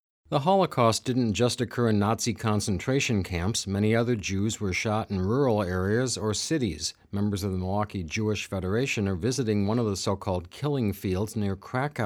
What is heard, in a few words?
SHOFAR